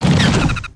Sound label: enter; grosky